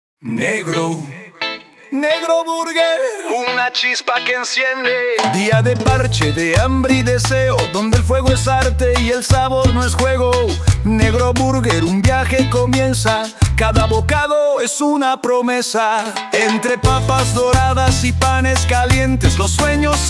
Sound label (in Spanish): Cumbia